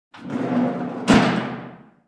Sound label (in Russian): jeleznaya reshetka closed; FEAR